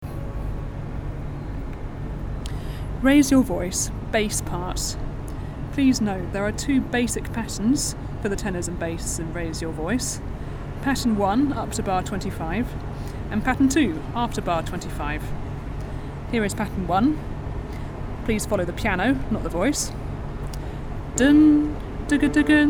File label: bass